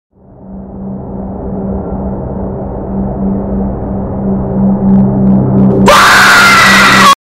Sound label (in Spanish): grito de terror